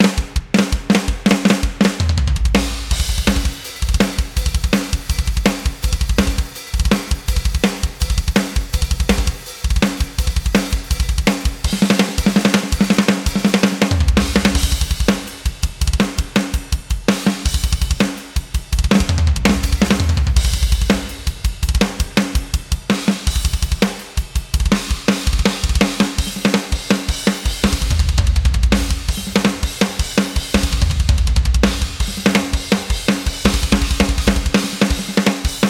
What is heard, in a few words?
Relentless Metal MIDI; Toontrack; Death and Darkness SDX